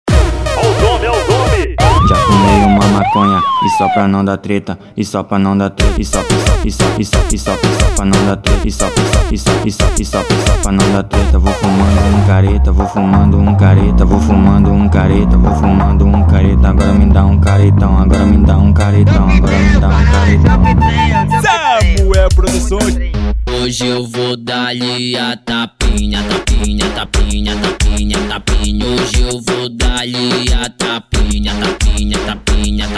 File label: loop